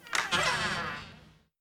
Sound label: wooddoor open; adams venture origins; Kismet